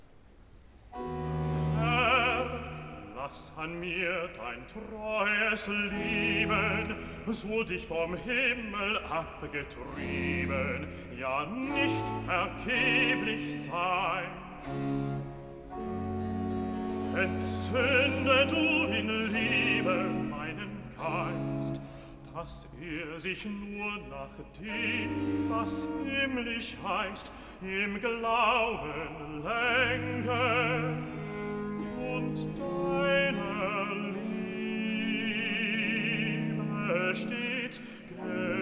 Cantata, Recitativo (Bass); BWV 180 'Schmücke dich, o liebe Seele'; VI. Recitativo (Bass) 'Herr, lass an mir dein t; Bach; Genova; 20th Sun After Trinity

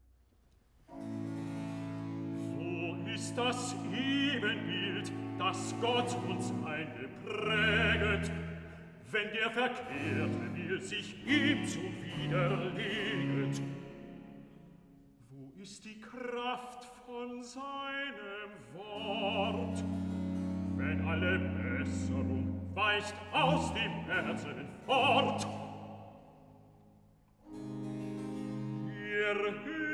Cantata, Recitativo; BWV 102 'Herr, deine Augen sehen nach dem Glauben!'; Bach; Braunschweig; 10th Sun After Trinity

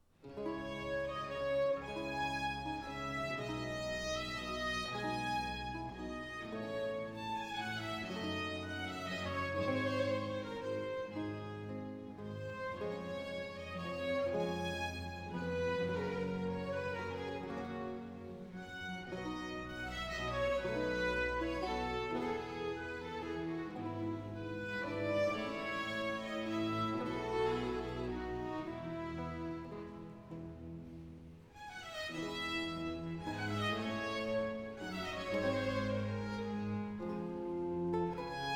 Concerto a solo leuto; Ugo di Giovanni, La Cappella de' Turchini, Antonio Florio; Concerto a solo leuto e violini in B; Cantate napoletane del 700

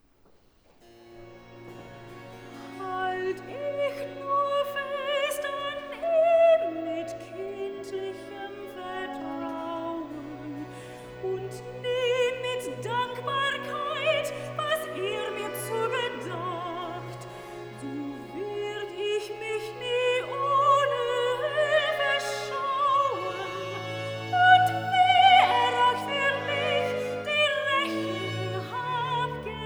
Cantata, Recitativo; BWV 187 'Es wartet alles auf dich'; Bach; Haddington; 7th Sun After Trinity

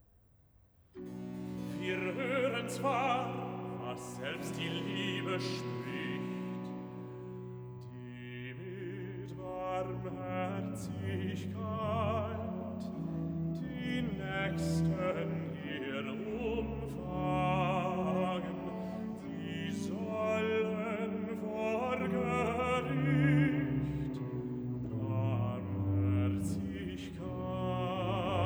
Cantata, Recitativo (Bass); BWV 164 'Ihr, die ihr euch von Christo nennet'; Bach; Frankfurt; 13th Sun After Trinity

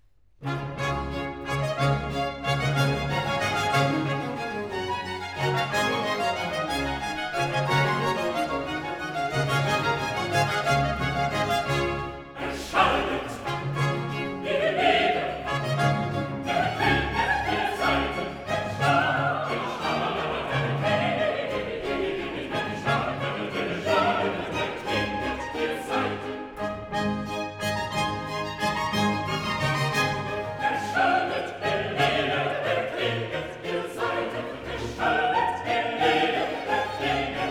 Coro; Cantata, BWV 172 'Erschallet, ihr Lieder, erklinget, ihr Saiten!'; Bach; Long Melford; Whit Sun

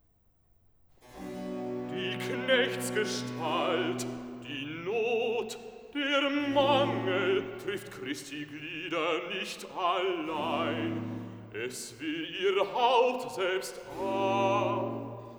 Cantata, Recitativo; BWV 186 'Ärgre dich, o Seele, nicht'; Bach; Haddington; 7th Sun After Trinity

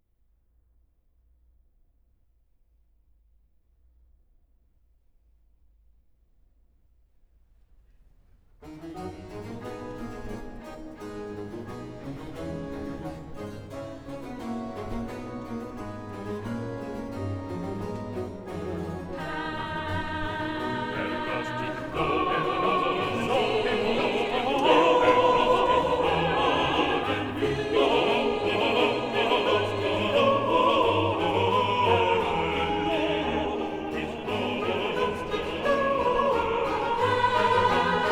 Coro, Choral; New Year's Day; BWV 16 'Herr Gott, dich loben wir'; Bach; Berlin; Cantata

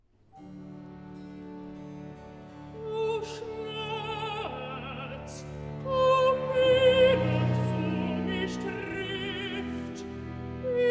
Cantata, Recitativo (Alt); BWV 48 'Ich elender Mensch, wer wird mich erlösen'; Bach; Potsdam; 19th Sun After Trinity

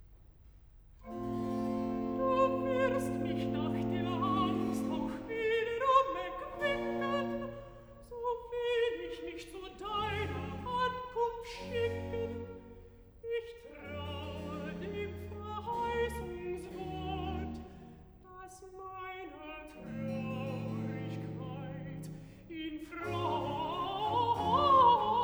Recitativo (Alt); Cantata, BWV 103 'Ihr werdet weinen und heulen'; Bach; Altenburg; 3rd Sun After Easter

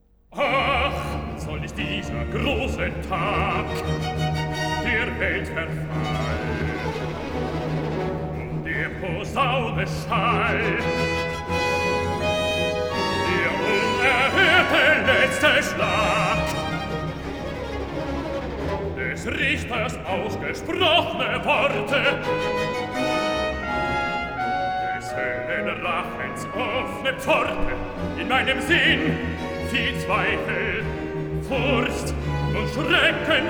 Recitativo con Choral, Bass; BWV 70 'Wachet! betet! betet! wachet!'; Part 2, IX. Recitativo con Choral (Bass) 'Ach; Bach; Lüneburg; 4th Sun in Advent; Cantata

